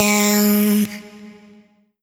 Vocal one shot Ab; Slate Digital Omnivox Vocal Sample Pack

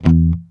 Bass Pick Short E, Electric Bass One Shots; Slate Digital BASSHOLE Sample Pack

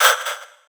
perc gasp; Drum Sample Pack; One Shots; Slate; SEISMIC

